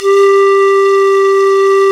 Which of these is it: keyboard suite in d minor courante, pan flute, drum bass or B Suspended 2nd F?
pan flute